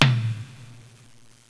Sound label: Drum